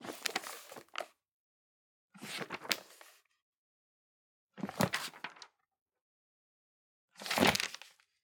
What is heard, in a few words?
Organic Paper Book Open; boom library; The Interface